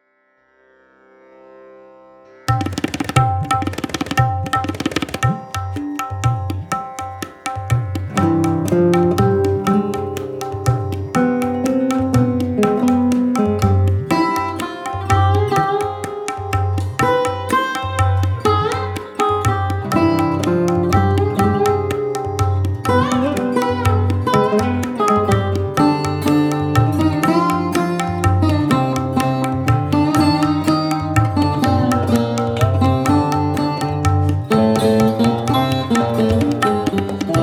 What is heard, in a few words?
Strings